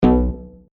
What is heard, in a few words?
incorrect feedback